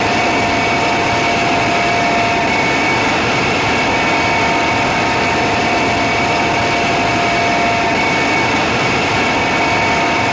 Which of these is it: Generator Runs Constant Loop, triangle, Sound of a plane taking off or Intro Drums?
Generator Runs Constant Loop